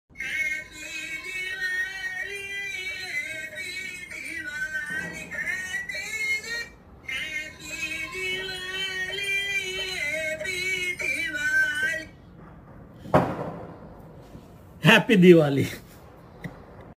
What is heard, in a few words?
ringtone; happy diwali